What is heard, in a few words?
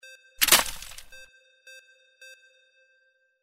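impact ice smash; windy beach audio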